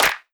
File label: Future Bass Clap